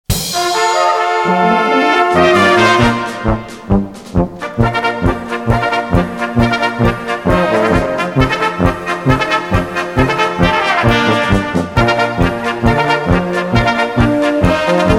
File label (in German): trompete